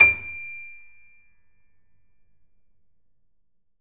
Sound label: Grand Piano D6 f